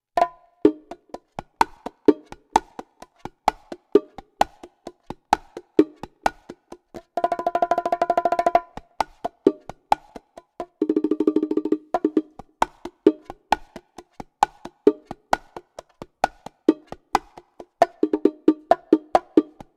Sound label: Bongos